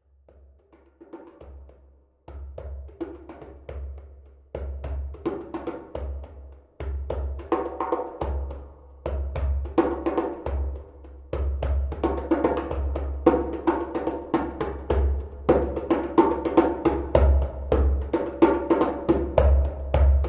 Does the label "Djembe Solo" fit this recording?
Yes